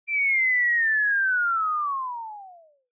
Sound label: Motion Falling Whistle Long